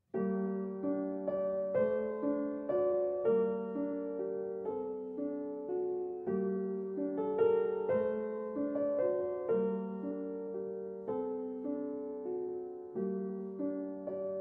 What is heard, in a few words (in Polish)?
wiosna g-moll 432 Hz; chopin; Op 74 No2